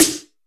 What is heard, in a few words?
SN, Snare; AKAI S6000 CD-ROM - Volume 4